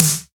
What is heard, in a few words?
rich top end acoustic snare sample e key